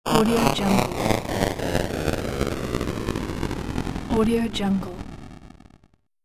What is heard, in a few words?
crushed game impact descent